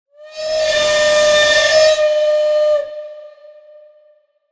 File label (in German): Siedihorn